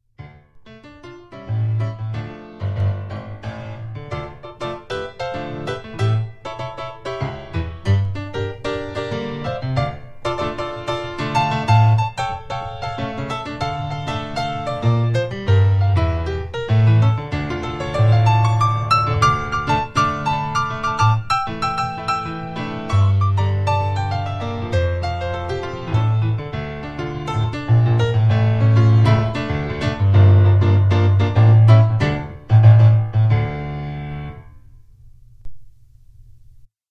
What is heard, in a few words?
Samba polonaise 92bpm, ballet class music